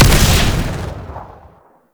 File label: Antimaterial Projectile Explosion; Warframe; Entrati; Conquest